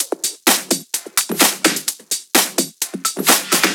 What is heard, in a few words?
Drum Loop 128 BPM Perc; bsounds Vol.5; VEC5 Drumloops; VEC5 Multi Loops; Drum Loop 40 Loop Elements